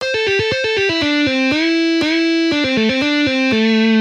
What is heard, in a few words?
Easy guitar licks